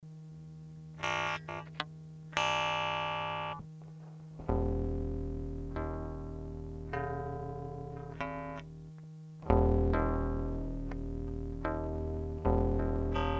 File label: warp633 guitar; modular